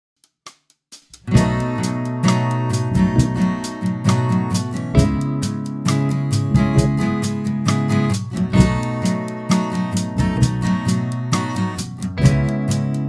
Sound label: B7 to C sharp m